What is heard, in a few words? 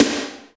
short reverb snare drum D key, snare drum sample tuned to the D note; snare drum samples